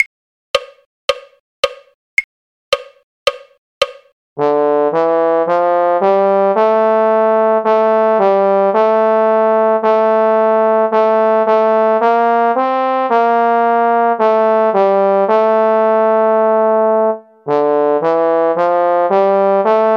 trombone; carols

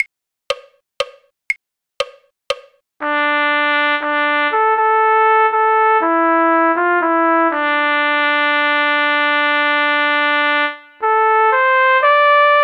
trumpet; Scarborough Fair; folk

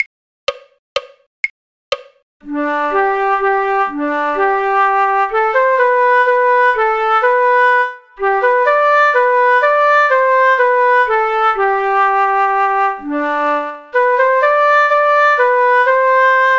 flute; sweet nightingale; folk

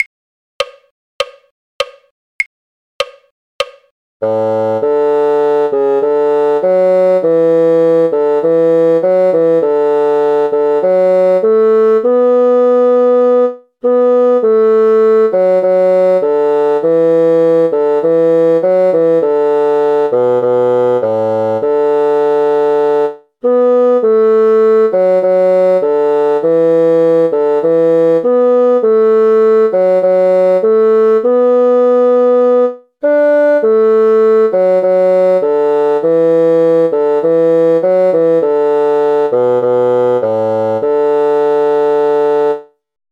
bassoon; auld lang syne; folk

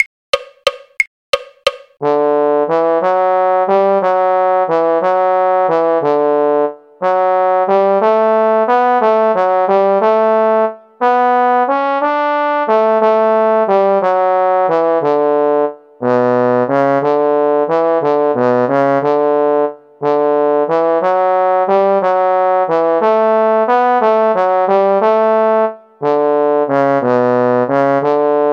trombone; carols

